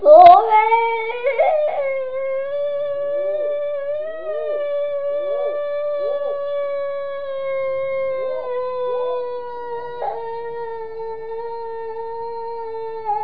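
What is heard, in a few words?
ehhhhhhhhhhhhhhhhhhhhhhhhhhhhhhhhhhhhhhhhhhhhhhhhhhhhhhhhh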